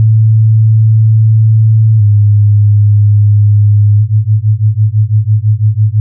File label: Beat superposition of 104hz and 110hz sine waves